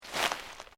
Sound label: Footsteps Sneaker Leaves Deep Step; Footstep Foley; Human